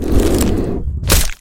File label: shapeshift; among us